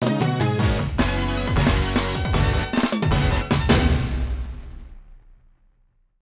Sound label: Jingle of Retire; Alpine Surfer; Namco System Super 22; arcade